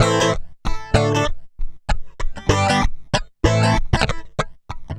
FUNK, FUNKY GTR

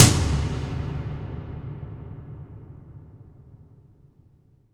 toms, cinematic impact, drum

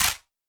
Wider Clap

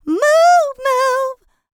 LICKS, Vocal R&B Dance; LT DANCE

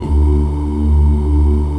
D1 MALE OO; Northstar - New Gold VOL-2

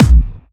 Clubby Kicks, Bassdrums

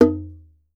earth drum; Voice Crystal - Steve Reid Definitive Percussion

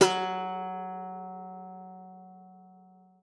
F#3, GUITAR; Xsample VOL-2

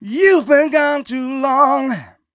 m 127 mel a, Vocals

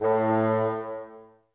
Bassoons A 2; Miroslav Vitous - Woodwind Brass Ensemble

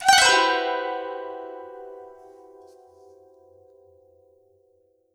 zheng, ZHENG HARP; World Instruments 2; STRINGED INSTRUMENTS

